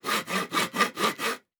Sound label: Saw Wood; Fantasy Sounds Bundle; Craft